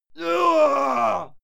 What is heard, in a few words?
male fight scream pain; soundpool